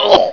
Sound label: choke, fighting